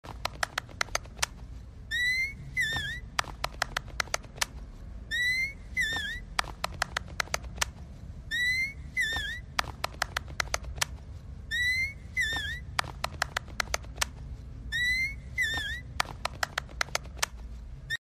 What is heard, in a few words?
yay emote; fortnite